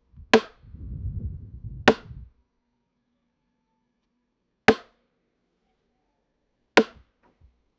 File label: loud wooden crack of base, baseball